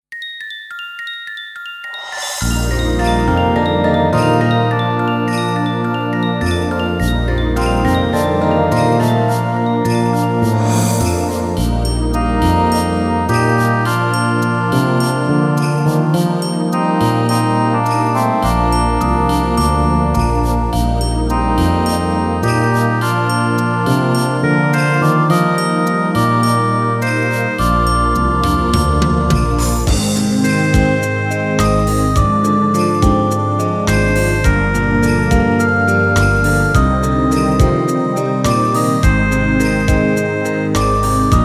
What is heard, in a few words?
Bagpipe Classic; Minna no Golf World BGM Tour